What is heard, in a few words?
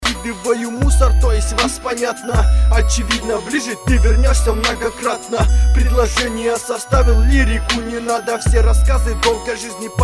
beats mehanizm